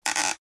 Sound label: Squeaking wood opening of a box